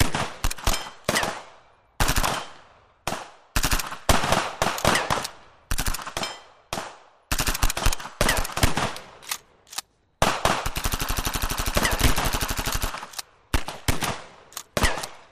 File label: FIREFIGHT, modern